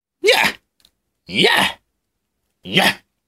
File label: Clown Attack, 남자